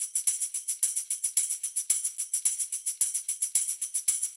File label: Tamb Proc 110, funk soul Beats; sampled funk soul